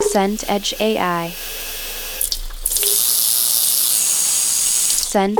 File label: tap running sink